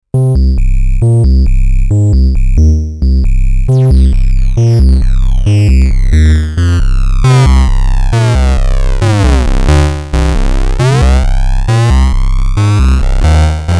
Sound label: Bit Crush